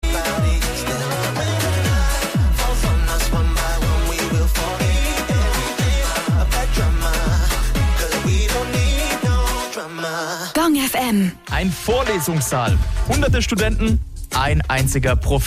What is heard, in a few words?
gong; gong-fm; intouchCONSULT